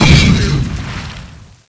Explode Blood; Defiance

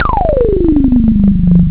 acid bass

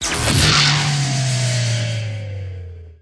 Force Field Shut Down; Defiance

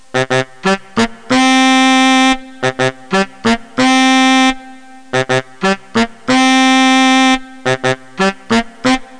SAX

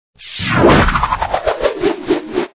slow bomb; Together in Jesus - First Eucharist - Computer Fun

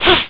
Slime Ball; Assassin

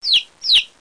chick; sprites

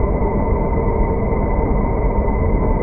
warp engine; Earth and Beyond; EA Games

